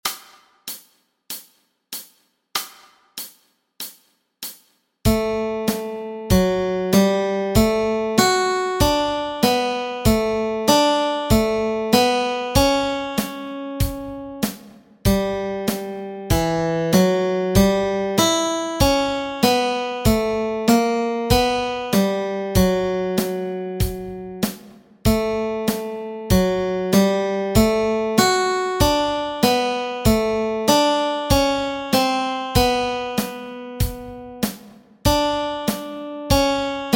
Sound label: melody